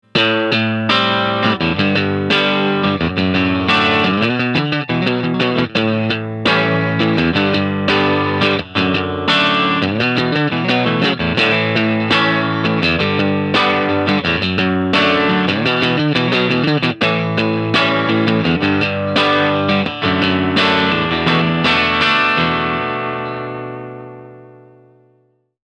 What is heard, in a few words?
Tele Bridge Clean